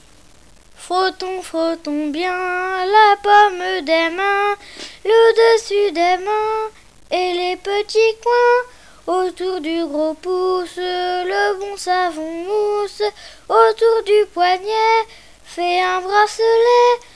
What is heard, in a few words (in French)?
chant lavage des mains